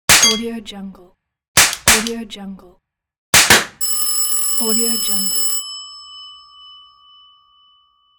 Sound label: carnival gun target shoot sequence